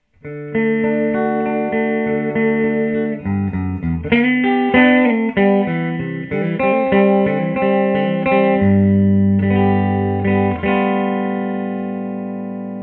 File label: humb clean middle, guitare; HUMBUCKERS GNB